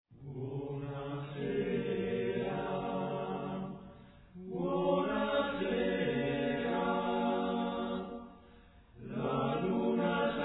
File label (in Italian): Buonsera voci maschili; CoroLaTraccia